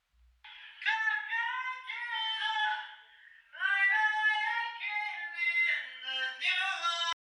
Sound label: hi C